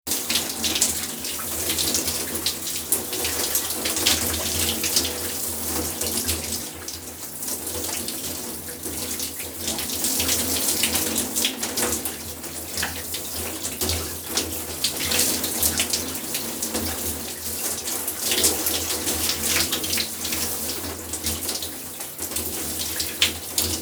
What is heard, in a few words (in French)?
Se doucher